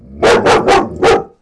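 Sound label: alsatian bark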